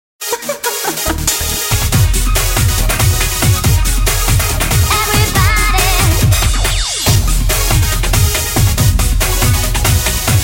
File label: music